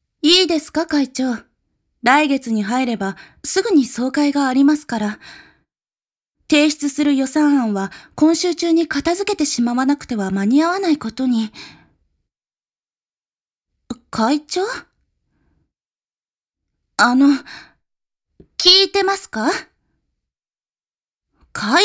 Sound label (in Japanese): 耳かき; まぐわ様は*コキらせたい; 高域弱め; まぐわ様は耳かきで興奮させたい